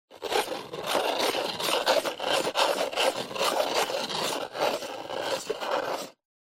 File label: Pencil Sharpener Sharpen